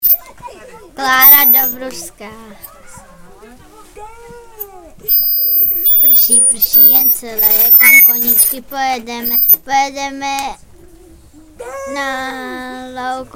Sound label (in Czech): slavíci